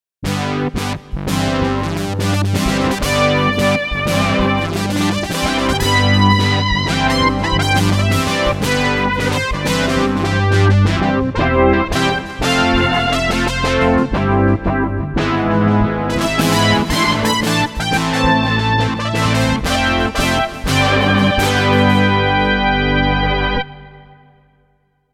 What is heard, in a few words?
FM Brass; Montage; brass synth